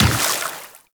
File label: Run Water; Footsteps; Warframe; Tenno